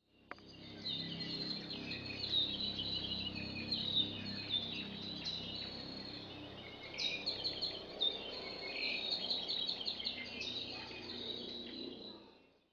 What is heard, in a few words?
tropical bird sounds